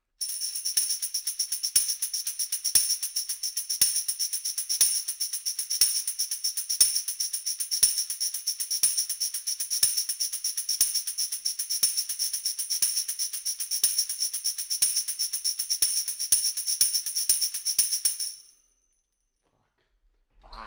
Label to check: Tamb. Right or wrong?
Right